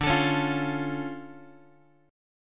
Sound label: D Minor Major 7th D down Guitar; x,10,12,11,9,x; DmM7 strummed; JGuitar